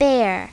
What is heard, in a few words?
bear; My Talking Dictionary